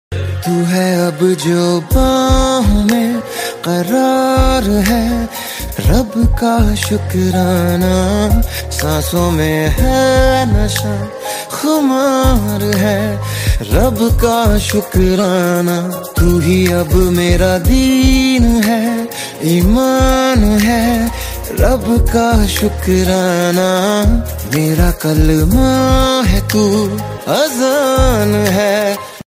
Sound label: Romantic Hindi Song Ringtone